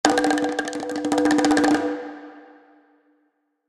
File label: near win bongos; rise of maya